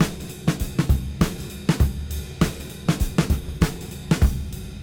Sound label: Cym Beat 99 BPM Rev